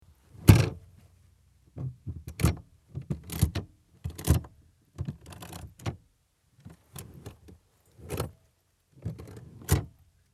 Auto Sitz verstellen

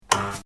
office klemme

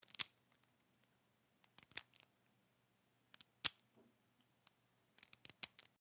Schere schneidet

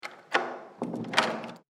Tuer schliessen

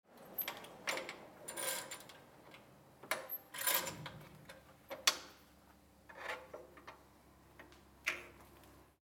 Werkstueck einspannen

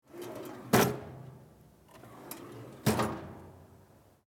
Schublade

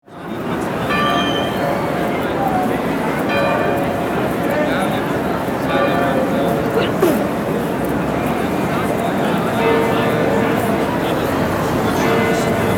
Rathausglocke Hamburg

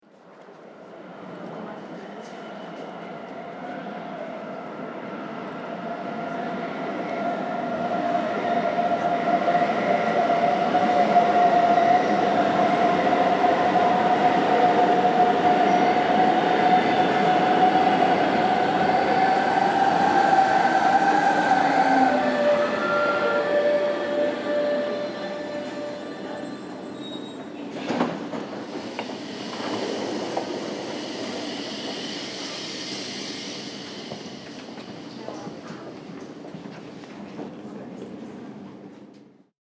S Bahn Ankunft

